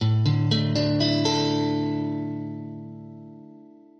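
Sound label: Asus4, Guitar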